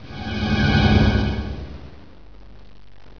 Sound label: Song